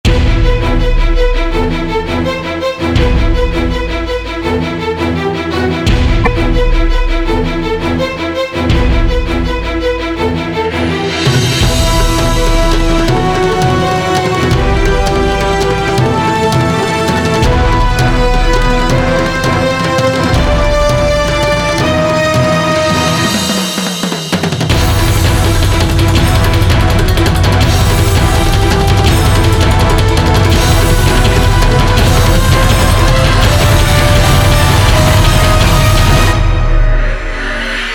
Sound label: epic music offensive; Immediate Music; jogging